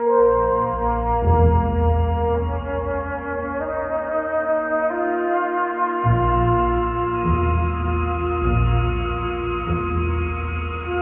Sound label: strings and flute, Sad; loops